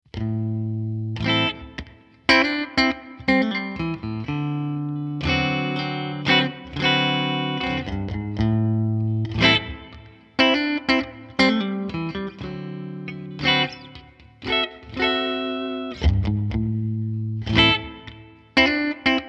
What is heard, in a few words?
Clean Middle